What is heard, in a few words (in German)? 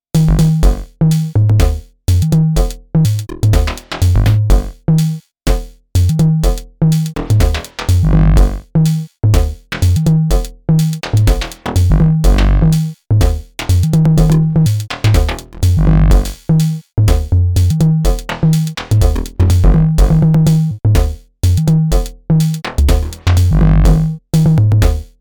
Erica Synths LXR Drum Eurorackmodul Mini Jam